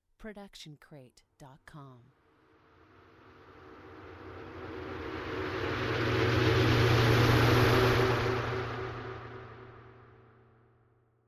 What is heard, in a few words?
distortion wave reverse echo reverb tail; SoundsCrate